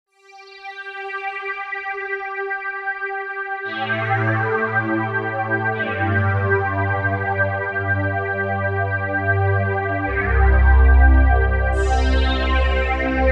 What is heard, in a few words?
Roland Gaia2 Wavetable Pad